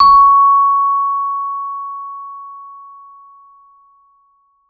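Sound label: vibe med ff C#5 left, Marimba & Vibes; Sampleheads; Dave Samuels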